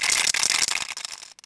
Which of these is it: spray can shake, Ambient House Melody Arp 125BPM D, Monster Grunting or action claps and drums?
spray can shake